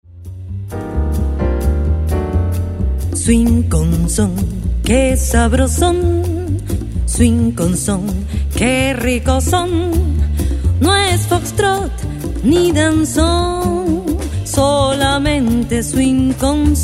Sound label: Swing Jam